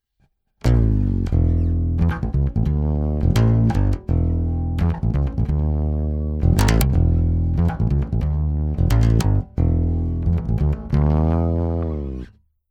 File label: High Z In Bass Godin Fretless flat; Millennia HV35